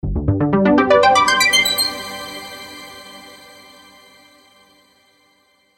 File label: scalez superstereo